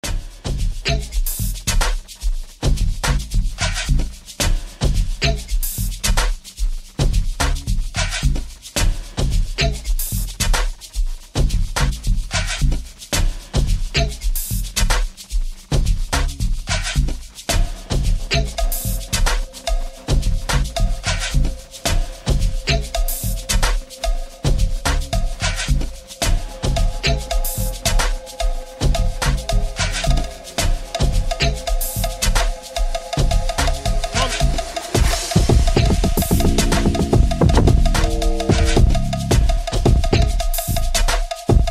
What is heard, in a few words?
Piano